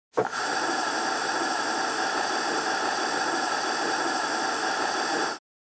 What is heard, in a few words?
Gas mask oxygen refill or release; Military Equipment